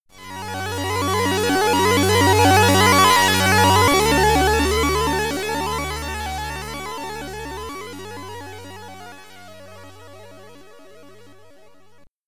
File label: Game Over (SID Stereo); Agent X II The Mad Profs Back; Commodore 64